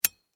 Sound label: Household Lamp Switch On; SFX Bible